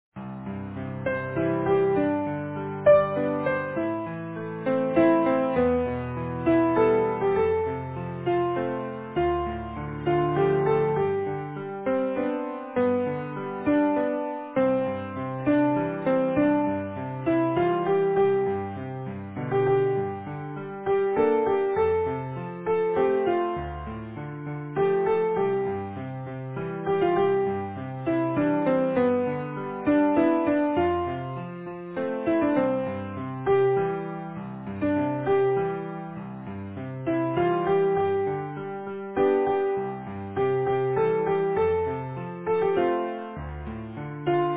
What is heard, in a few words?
easy piano